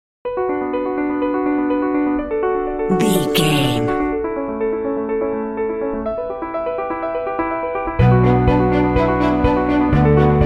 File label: inspirational cinematic beauty and elegance alt end